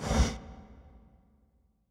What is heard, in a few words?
ship Int Door Open